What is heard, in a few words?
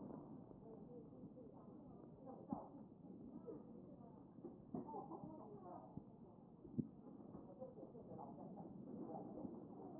chest